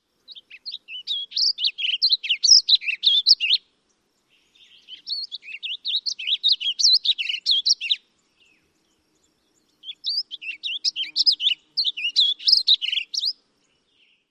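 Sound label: warbling vireo song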